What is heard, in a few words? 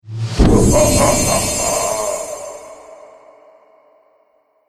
Pantomime Baddie Appears; Cartoon Sound Design Sound Effects